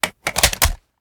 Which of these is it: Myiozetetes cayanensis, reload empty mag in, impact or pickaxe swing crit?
reload empty mag in